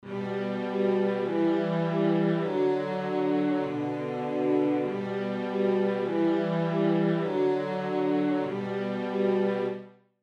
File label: delay